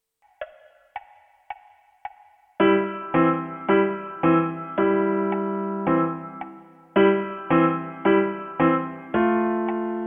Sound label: Samba, piano